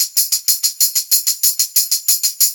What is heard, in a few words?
Brass Tamb, Brushes, Perc Loops; Sonic Foundry; Loops & Samples Drum Tools